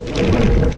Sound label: cry